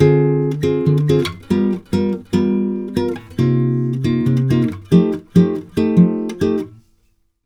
CHORDS, Brazil Bossa; USB Soundscan vol.16

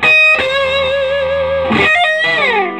SOLO, Vintage Blues Guitar

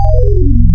Prime Sweep, wavetables; Animoog

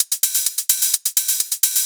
Hihat Loop 128 BPM

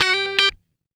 Guitar Licks

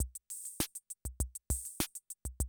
CR78, DrumLoops

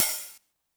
open hat Long Decay, 909; classic machines; 909mod; OH - OPEN HAT

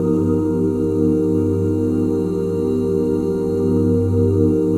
OOH G SHARP9, OOH CHORDS, Vocal, Jazz; ILIO; Vocal Planet; Jazz & FX

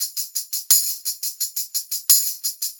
Brass Tamb, Perc Loops; Drum Tools

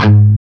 CHUG G#1 R, Guitar; East West; Steve Stevens Guitar Samples Collection

